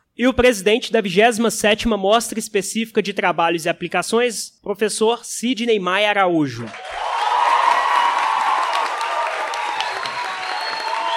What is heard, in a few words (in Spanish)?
aplausos